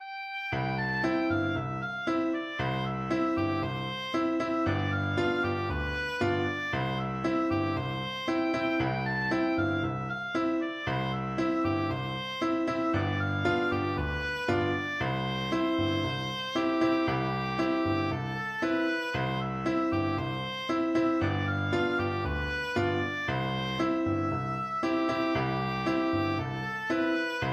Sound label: mango walk, oboe